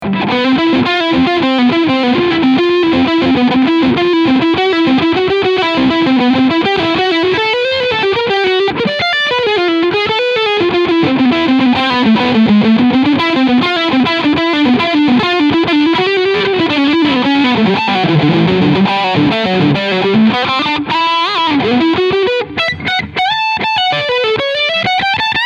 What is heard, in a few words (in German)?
FGN Expert Flame E Gitarre Overdrive Leadsound Steg PU